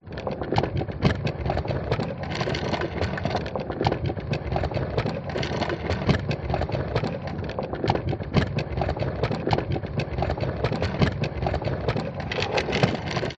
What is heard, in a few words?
wooden trolley push roll wood floor; foley